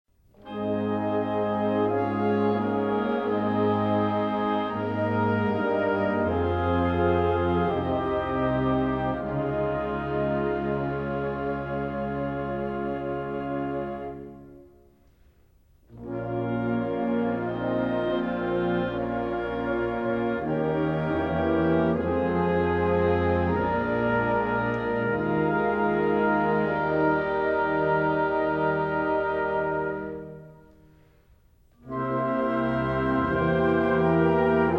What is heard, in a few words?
concert a flat chorale